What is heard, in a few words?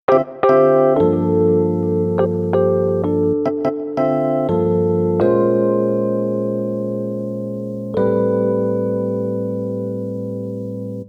Rhodes, Cinematic Funk; Sonic Foundry (Sony Creative Software); Cinematic Funk Urban Tone Poems